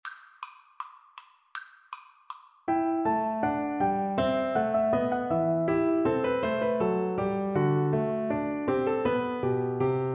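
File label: bourree VLC karaoke, Cello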